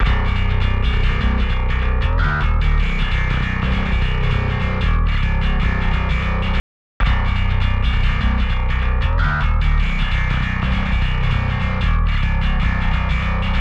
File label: bass test; grit body